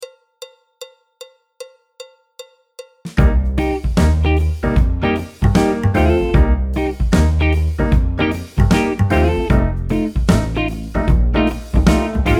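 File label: backing track, Jazz; clarinet, flute, tenor, trumpet; Jazz Syllabus Level 1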